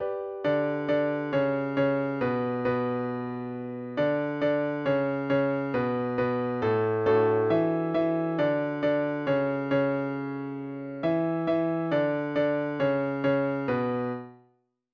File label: instrumental